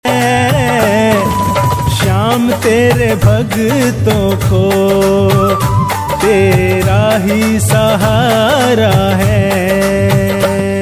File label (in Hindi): Khatu Shyam Bhajan